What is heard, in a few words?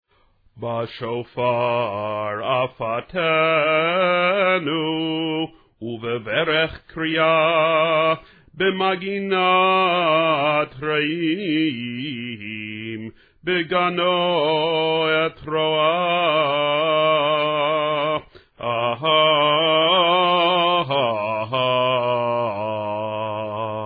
Shofar